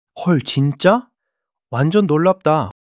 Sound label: surprise